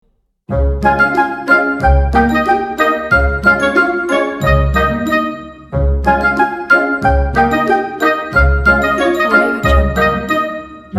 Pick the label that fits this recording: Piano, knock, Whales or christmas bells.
christmas bells